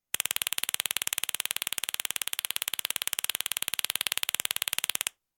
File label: tazer